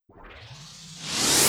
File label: FX Reverse; VEC5 Multi Loops